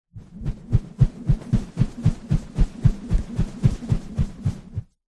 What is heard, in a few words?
foley rope spin swing fast whoosh